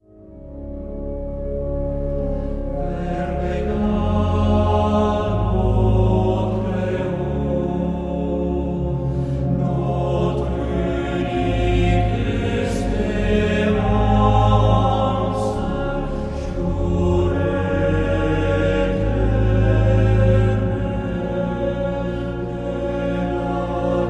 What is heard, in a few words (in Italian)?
voci